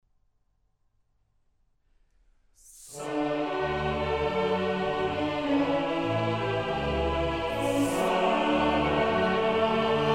Missa brevis in D Sanctus; Mozart; Missae and Requiem; Davis, Gardiner

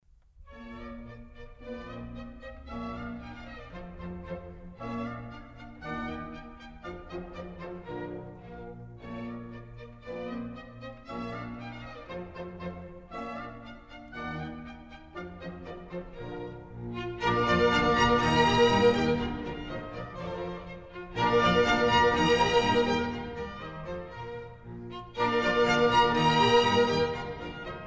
Contredanses, Bb Major; Mozart; Dances and Marches

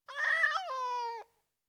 Tom Cat, Individual Meows; Cats; BBC Sound Effects Library

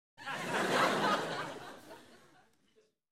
Light Entertainment Audience - Laugh; Rec. At Bbc Paris Theatre

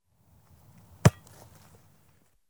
Rugby - ball - single kick; The BBC Sound Effects Library; Sport and Leisure

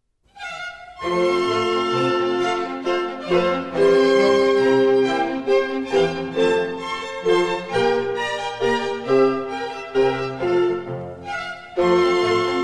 German Dances, F Major; Mozart; Dances and Marches

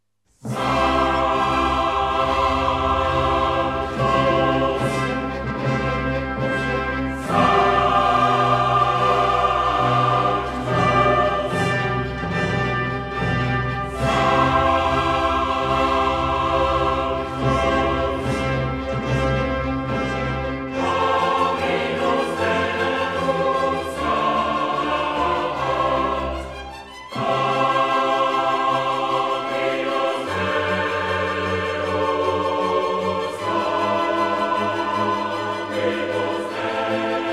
Missa in C min Sanctus; Mozart; Missae and Requiem; Davis, Gardiner

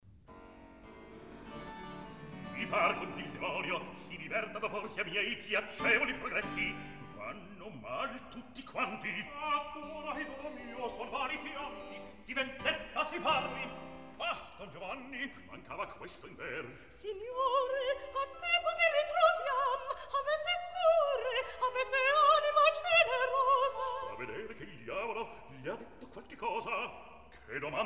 Recitativo; Mi par ch'il demonio si diverta; Mozart, Wolfang Amadeus; Don Giovanni

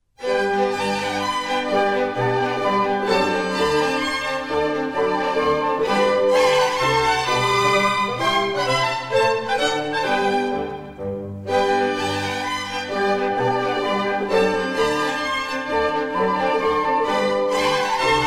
Minuets, G major; Mozart; Dances and Marches

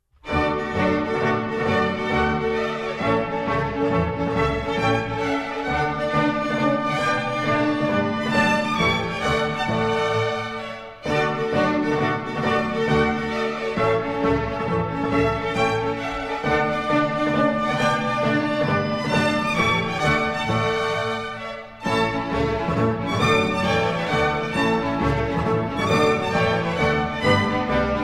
Minuets, D Major; Mozart; Dances and Marches

